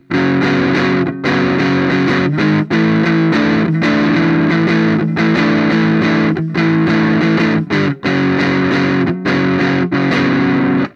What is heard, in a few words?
munch crunch